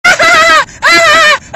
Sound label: hahahahha